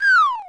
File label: Spike Throw